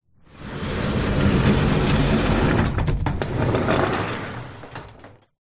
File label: household garage door slide roll up open